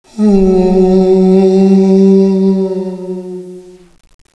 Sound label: halloween moan; de dust2 halloween